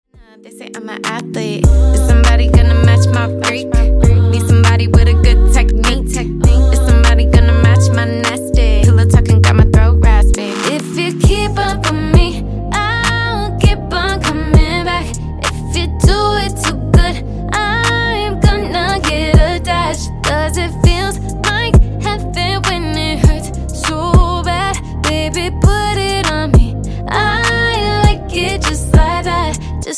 Intro Outro, 100Bpm

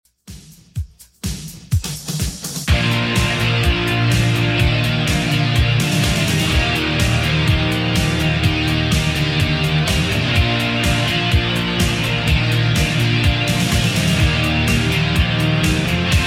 80s Redrum 125 bpm CLEAN

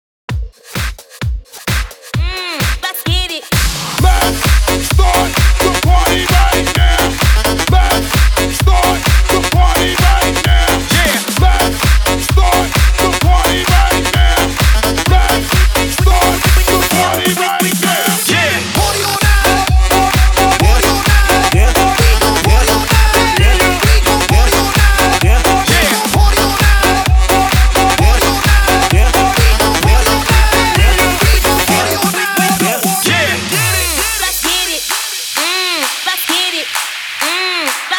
130 BPM

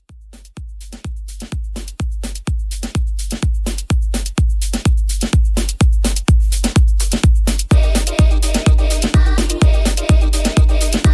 Club Mashup

